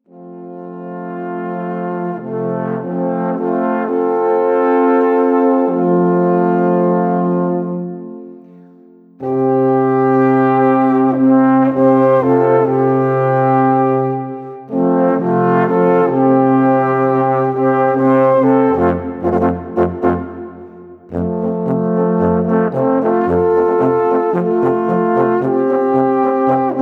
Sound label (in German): Polka